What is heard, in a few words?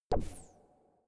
space laser gun bubble shot hard; soundpool